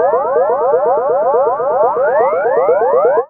ANALOG ECHO; Zero-G Ambient 1

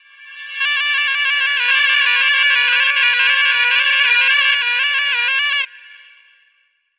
Shenai, Wind Instruments; Sonic Foundry (Sony Creative Software); World Pop

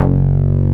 MOOGY BLUES, BASSOUNDS; Masterbits; Dance Trax

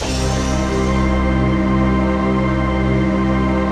CHRD PAD; Best Service; XX Large Pads; Sample CDs

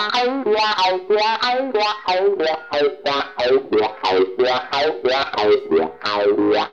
WAHS FILT, Funk Gtr & Wah; PlatinumPhattCD2; E-MU Producer Series Vol. 8 – Platinum Phatt

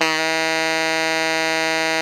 TENOR GROWL E3, Saxophones; Greytsounds; Philip Wolfe David Crozier's Saxophones

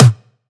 Tom Mid; Antidote Advent; Infected Track Drums

